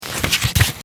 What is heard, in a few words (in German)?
office blatt